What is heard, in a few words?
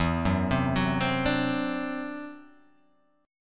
E 7th Suspended 2nd Sharp 5th, E, down